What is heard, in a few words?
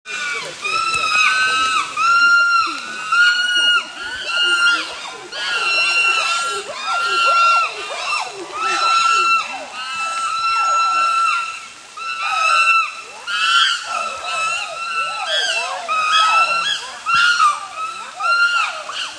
Chimpanzees